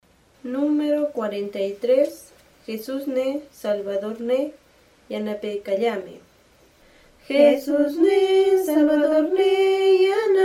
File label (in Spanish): cancion